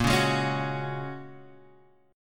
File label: A sharp Minor 7th Sharp 5th A sharp, Guitar Half Step Down